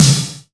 Snares; CMI

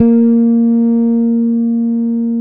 Bass El Full Bf3; CMI

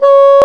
Bassoon; CMI

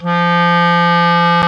Clarinet; CMI

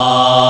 VOX AHH; CMI

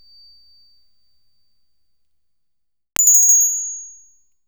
Bell Finger; CMI

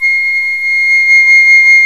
Flute; CMI

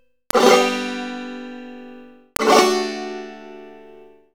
Banjo; CMI

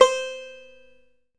Banjo C4; CMI

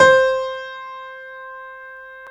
Piano Grand; CMI

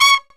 Brass Stab; CMI

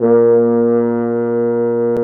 Brass Mute; CMI

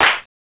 CLAP, CMI

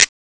FISHS; CMI

